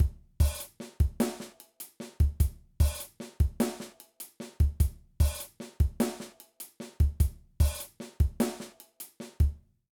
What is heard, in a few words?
Shuffle Groove, drum